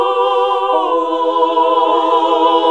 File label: SING, CLASSIC VOX; Best Service; Hallelujah